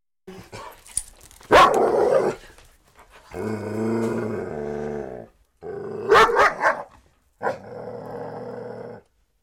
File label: Scary Sounds - Dog Barking; ringtones